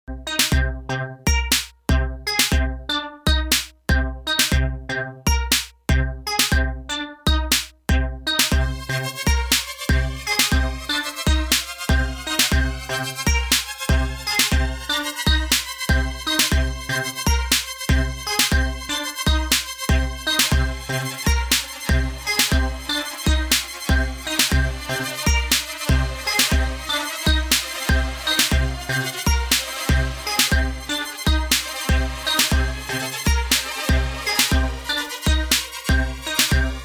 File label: DiscoStrings Filter Macros; Cicuit